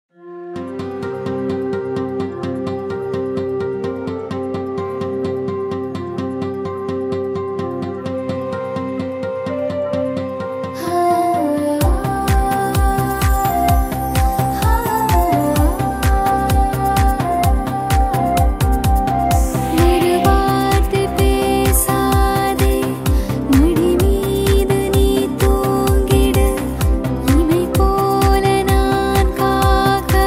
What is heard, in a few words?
Female